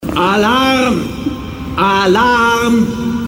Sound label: alarm